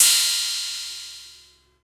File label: drum crash f key, Royality free drum crash sample tuned to the F note. Loudest frequency: 6560Hz; Cymbal; single hit; one shot